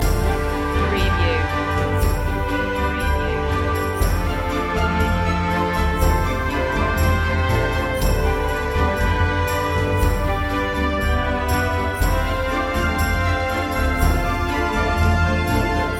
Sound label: blooming spring short loop